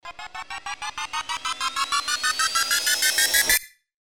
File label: RISER